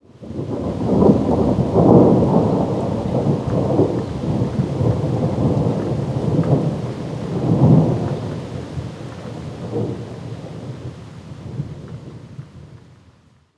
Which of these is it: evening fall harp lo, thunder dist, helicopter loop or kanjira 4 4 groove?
thunder dist